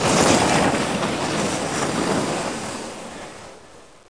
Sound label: SSlide; SuperBike2001